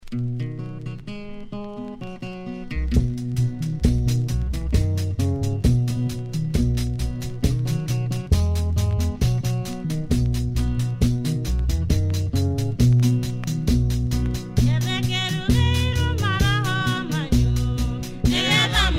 Acoustic Guitar, drums and vocal choir, nice track, rhythm changes on the flip